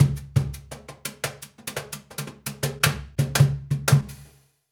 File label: Cajun, Percussion, Drums; Essential Percussion